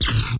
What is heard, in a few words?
fire; unreal